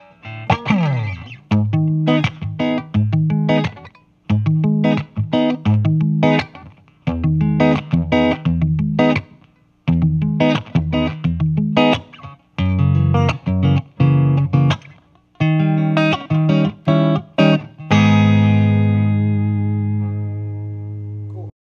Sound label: Surf like before